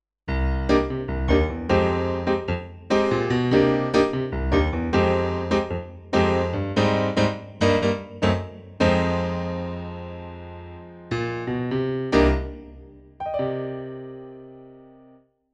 Piano

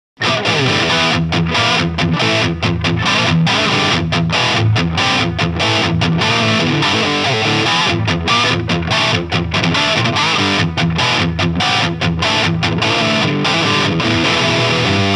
Hagstrom Super Swede Lead

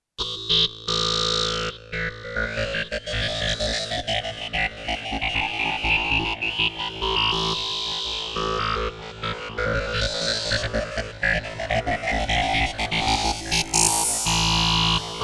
SH-4d Model Wavetable; Learning Machines

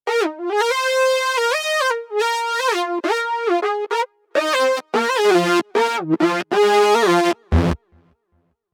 Akai EWI4000s Octave

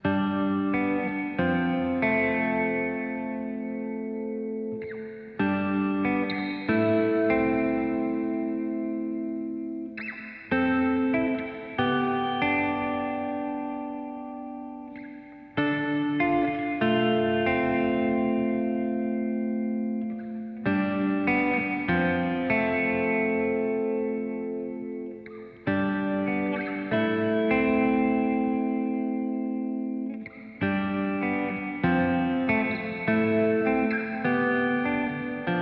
Boss GT-1000 Core Reverb Ambient; Vergleichstest

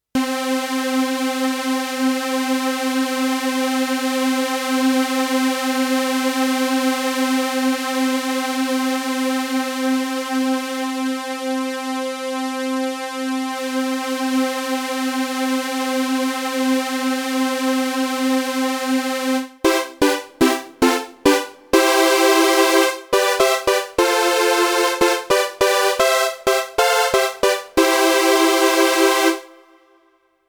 Super SAW

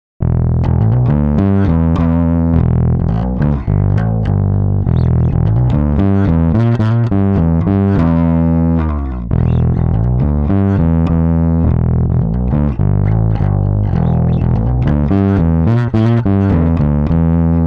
MiniMax TransTube

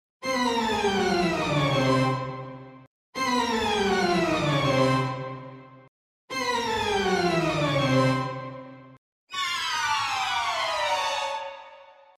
Ensemble Strings Full Mid Run down; Chris Hein

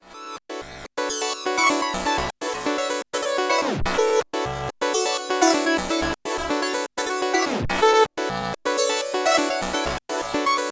DirtyGloop; 1010music; fxbox

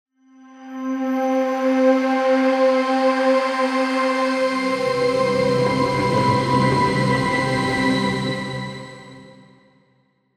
Textures Modal; Gravity 2 Menu

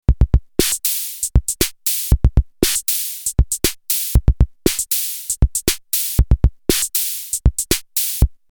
EMW T-Drum 70 Kit

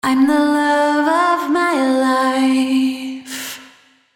Vocals Lead Vocal

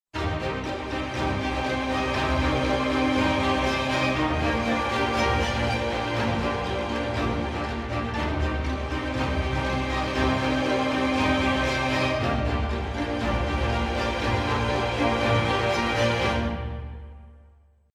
Orchestra Dark Threat; TO Animated Orchestra; Winter Orchestra

